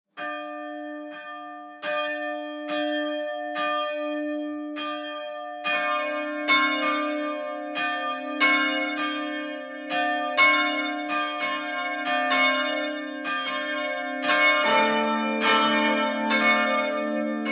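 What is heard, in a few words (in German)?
Glockengeläut Kirche; Zell; romrod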